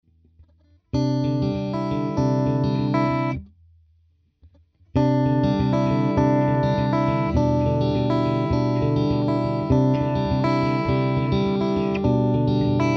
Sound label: Universal Audio MAX LA 2A Chords Fingerstyle